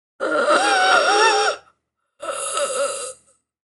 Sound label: female wheeze; Barotrauma; Real Sonar